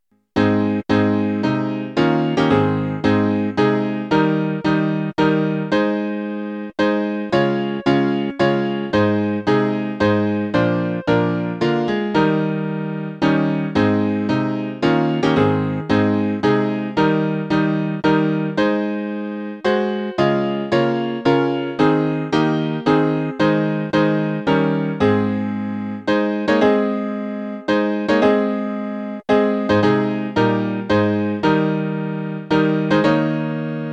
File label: To God Be the Glory (piano)